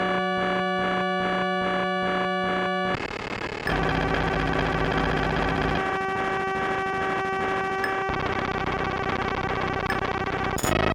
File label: sk-2 glitches